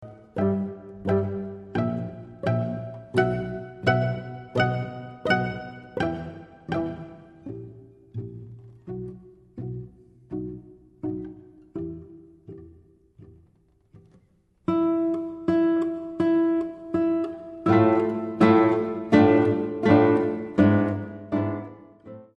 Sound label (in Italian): Adagio e Spiccato; vivaldi; concerto 2